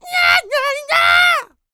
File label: A YELL, MALE HUMAN; ILIO; Vocal Planet